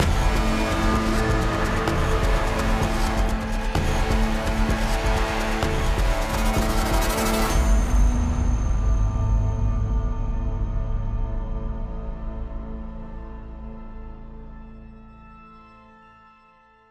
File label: Victory; apex legends season 12; Ash